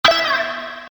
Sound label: guitar cut